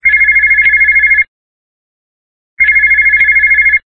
cellular device tone

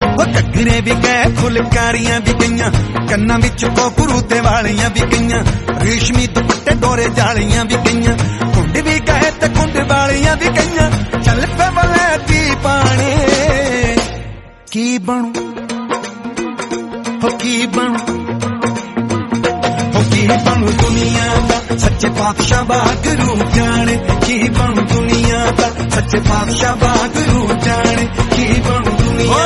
punjabi song

